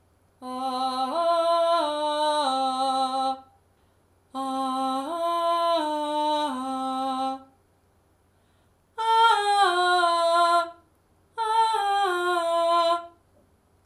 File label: Registers; Listen to the change in register; vocal registers; singer